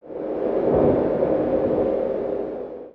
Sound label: Wind Cave One Shot; Lego; ambience